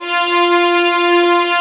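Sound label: Vio legato F3; Digital Sound Factory Virtuoso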